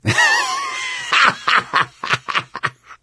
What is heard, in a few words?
laughter, gambler, survivor voice